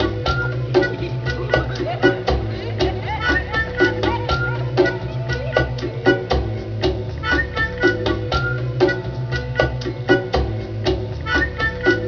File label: song; cartooncity